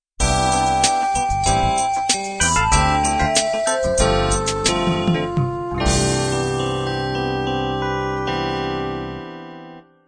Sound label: 16 beat soul end